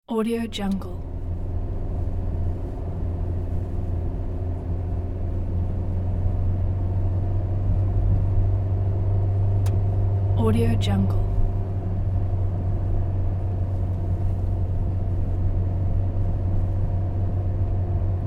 in vehicle journey ambiance; radiomocas

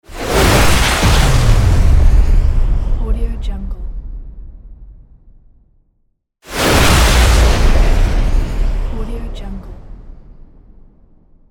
rocket explosion

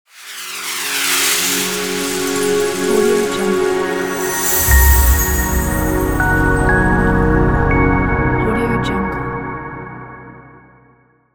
crystal reveal logo; kirillluzin

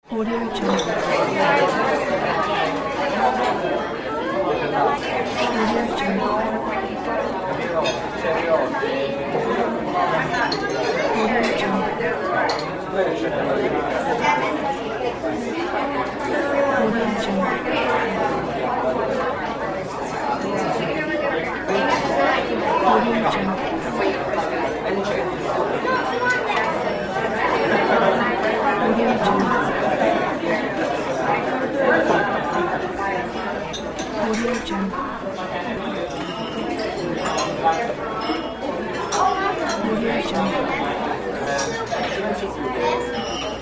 restaurant sound effects; billionsounds

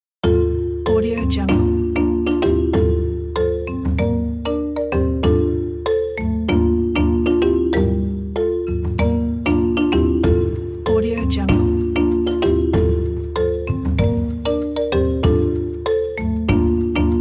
africa

